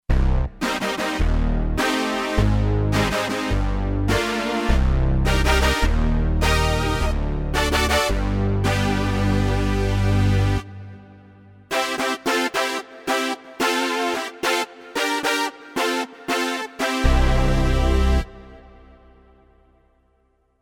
Kurzweil K2500X Big Synbrass

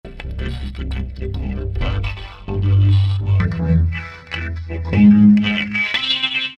Sfx Deep Vocoder, Alesis Micron; Factory Programs

